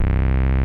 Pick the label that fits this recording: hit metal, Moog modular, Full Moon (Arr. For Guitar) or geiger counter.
Moog modular